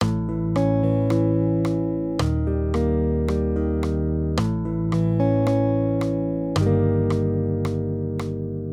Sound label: maj triads; A-Eb; accompaniment